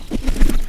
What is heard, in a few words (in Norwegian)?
vinger